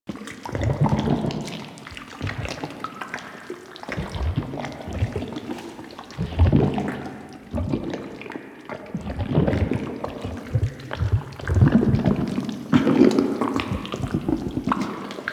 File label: Cyst Loop Med; Ambience; Props; Infested Eris; Microplanet; Warframe